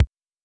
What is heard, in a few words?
Kick, Fricke MFB 512; Drums